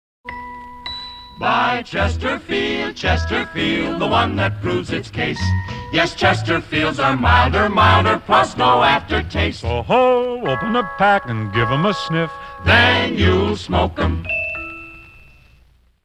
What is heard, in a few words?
Chesterfield Jingle